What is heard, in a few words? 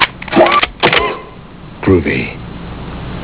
groovy